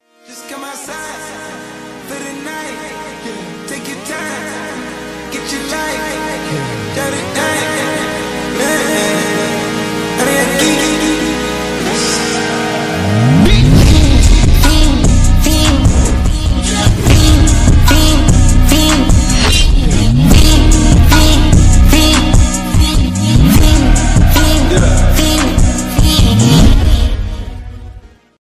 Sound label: ringtone